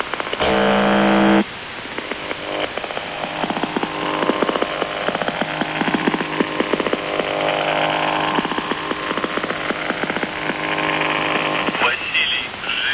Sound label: buzzer usb msg